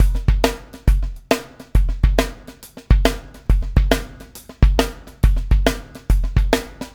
PEAK, Backbeat

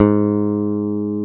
G#2, BASSES, Roland

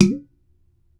KIM KIM, African Perc; E-MU Formula 4000 Series Vol. 4; Earth Tones

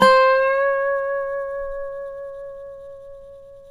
SLIDE, Dobro Slides

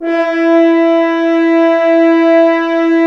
BRS, FHns Dry; Roland L-CD702; F.Horns 2

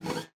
GUITAR FX; Xsample VOL-2

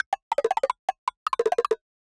Random Blocks; musicradar; cartoon caper

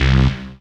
RAVE, RAVESYN

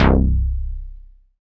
SYN SHORT, Dance Synth; Sound & Vision - Gigapack I CD 2 (Roland)

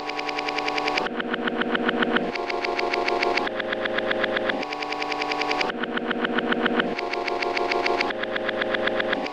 rev chord; Polyend; Loops n trouble

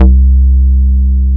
HOUSEY C2, BASSES; Best Service Alpha Dance vol.2

